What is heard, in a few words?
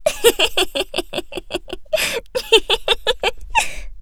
laugh; Eternal Return; Tia; ENG